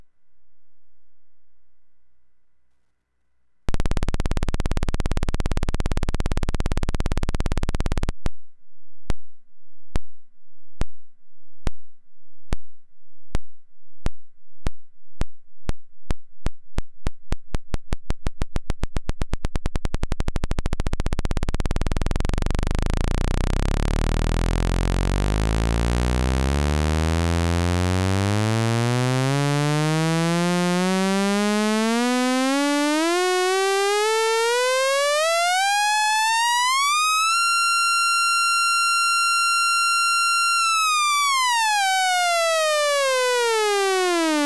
DVCO Sweep Saw; macbeth; xseries

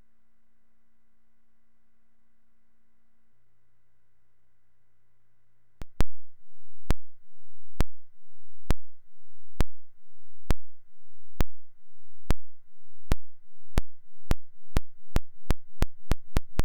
DVCO Sweep Ramp; macbeth; xseries

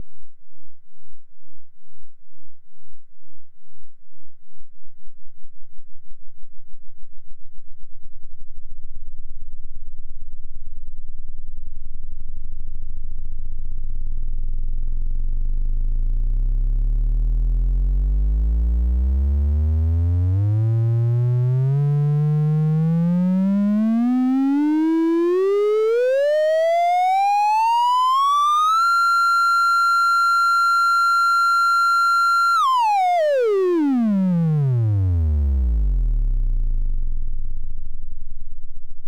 DVCO Sweep Tri; macbeth; xseries

